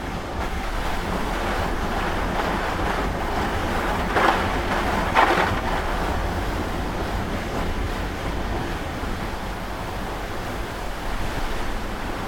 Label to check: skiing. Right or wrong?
Right